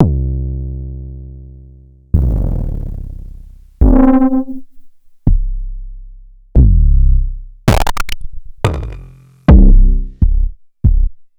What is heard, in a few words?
Kick c